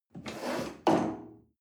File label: chair wood take slide medium